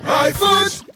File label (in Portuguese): vinheta